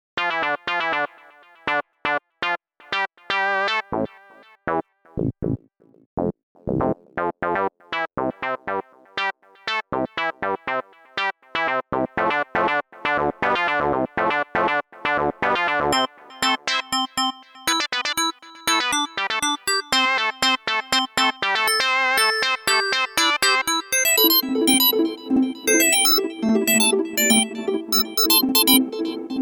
Keyboard

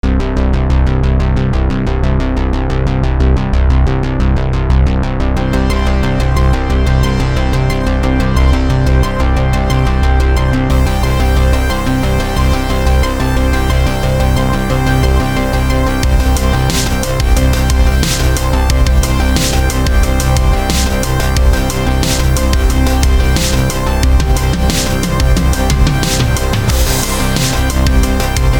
retroooo

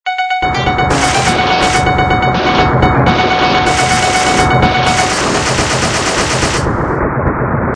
defmetal

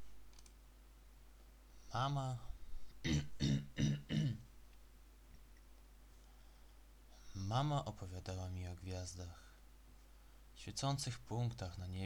dub

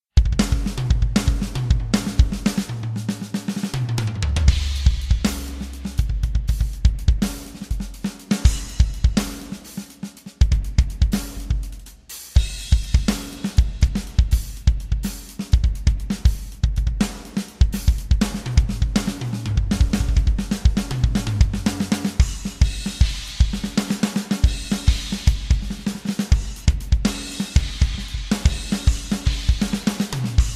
drums

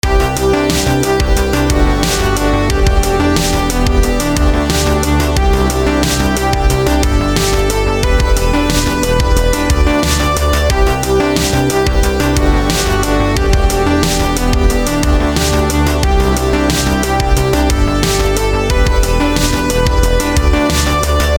retro

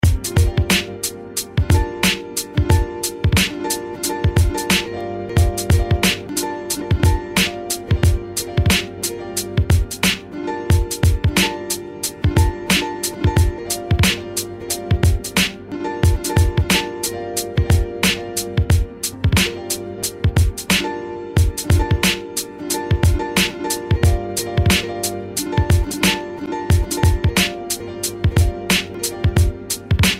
piano

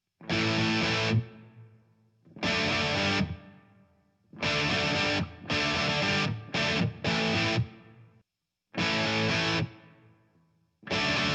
strat

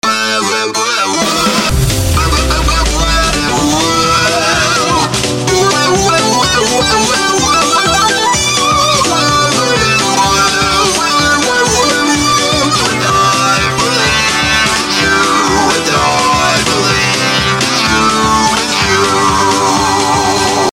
vocoder

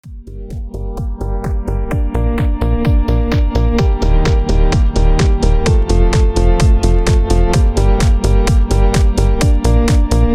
disco

